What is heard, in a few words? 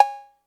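cowbell, drum machines, Roland CR-8000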